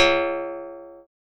XTRA PERC

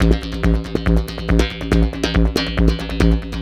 Beats, Industrial

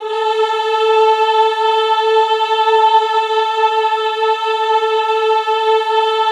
EHH A3, Classical Choir; Best Service; Extended Classical Choir; EHH MIX

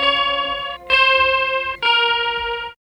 GTR

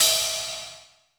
JAZZ CY RD, JAZZ KITS; Crypton; DrumBase5000

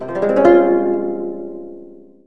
koto gliss F2; AKAI S-Series CD-ROM Sound Library VOL-7

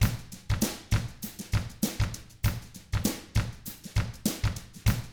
FUNKY, Drums

